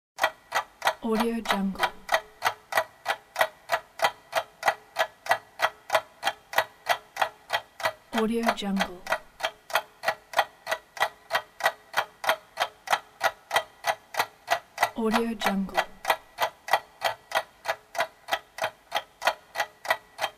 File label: clock ticking; sound-create